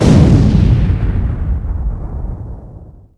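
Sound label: explode; UrbanChaos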